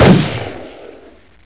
back Fire; HOEDOWN

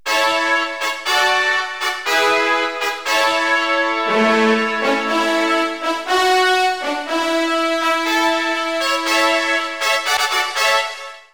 fanfare; KABOOM! - Wild and Wacky Sound Software; music

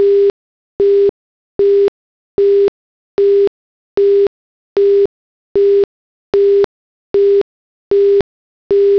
busy sound

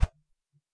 Weapon switch; 3D Game Programming All in One; KOOB

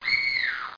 SCREAM

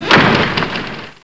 slam; 7invasores

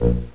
Throb; LightSpeed

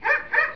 pup; Desktop Themes 2000; Tiere; Pooped

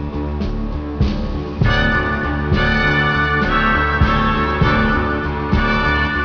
Victory; Galactic Frontiers™ 1.0.2

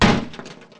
boss hit

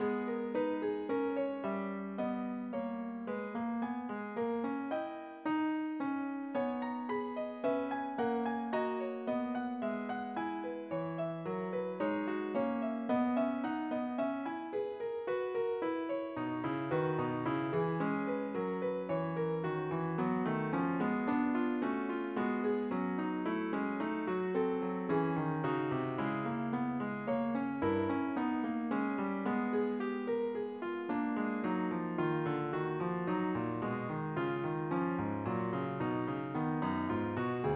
P #18 in G# Minor, midifile; Bach Book 1

